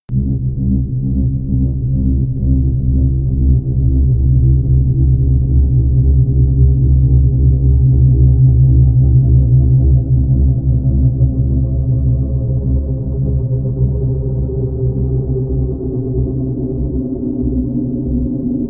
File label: drone scorch very low